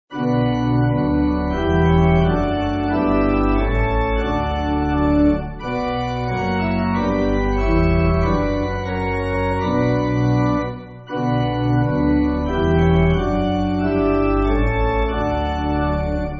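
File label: Pipe, Music Backing Files for Churches; I Look In Faith; Swedish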